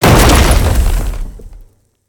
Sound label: slam; argus; smite; hera